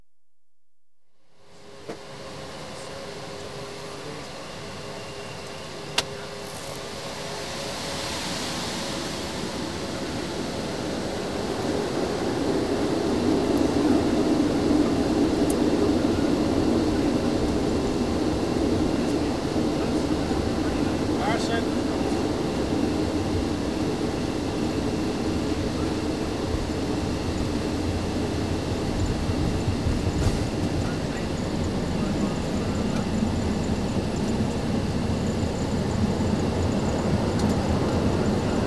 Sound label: Concorde Supersonic Jet Airline; Aircraft; BBC Sound Effects Library